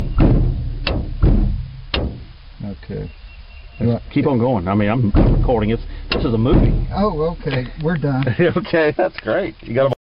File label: recharge, RCA sound powered element; How to repair a RCA sound powered element